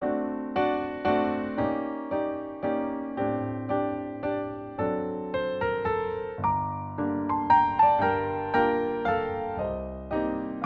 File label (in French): BC 3x4 Valse sol mineur, ballet music